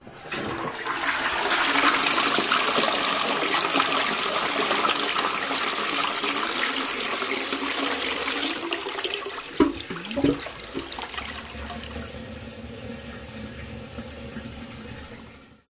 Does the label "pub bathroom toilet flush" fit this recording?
Yes